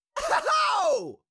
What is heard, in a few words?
Cartoon little monster voice shout scream short